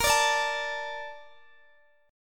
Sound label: A sharp Major 7th, Guitar DADGAD